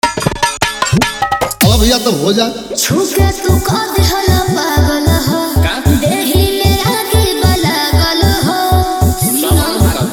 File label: New Bhojpuri Dj Remix Song